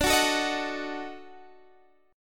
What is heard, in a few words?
E flat Minor Major 7th Flat 5th, D